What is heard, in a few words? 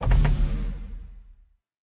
spin button click; whos the bride